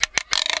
prc, percussion mix; PS-28 Vocal House Samples; Drum & Perc

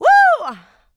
vocal house adlibs; PS-28 Vocal House Samples

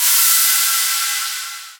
Cymbals Ride; Vengeance Samples

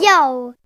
Vocal Oneshots; Vengeance Samples; Vocals

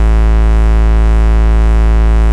Bass Root A

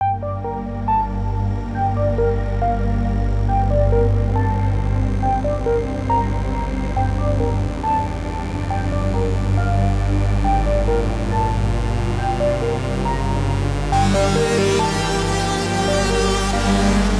138BPM Complete Mix, Gminor; Vengeance Samples; Outlaw Kit

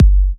Bassdrums; Vengeance Samples

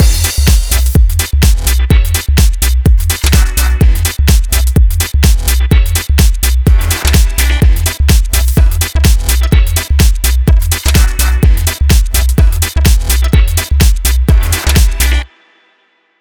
126BPM Tech Freak Mix; Vengeance Samples; Tech Freak Kit

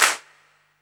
Claps

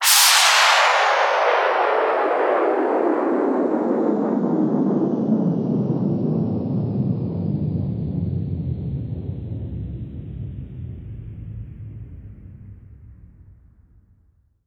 Downlifter

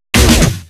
Loops Fills; Vengeance Samples

